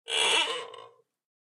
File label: Chair Squeak